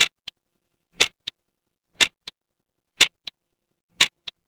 mechanical clock tick

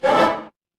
feedback level up

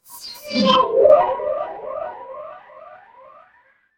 magic scifi teleport

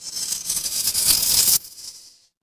magic scifi spell

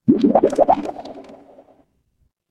water bubble

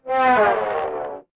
feedback fail

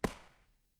impact gunshot

